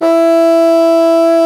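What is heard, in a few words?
hard bassoon E4; Northstar - New Gold VOL-2; SOLO BASSOON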